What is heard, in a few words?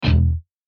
Guitar Pluck 150BPM E; Unison Artist Series Essentials